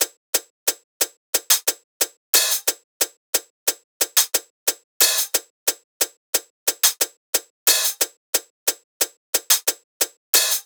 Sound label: hat loop 90, Drums; Pop; Hat Loops; windover; Slate; SD PASADENA Pop Sample Pack